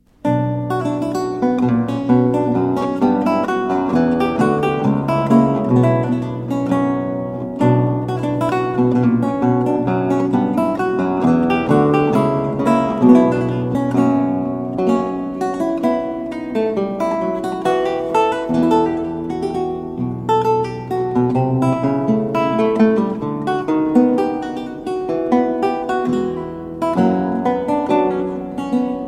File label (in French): Gavotte, Luth Baroque; Suite en Do mineur; Charles Mouton